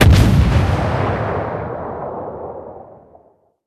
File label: ambient port; World Of Warships; port3d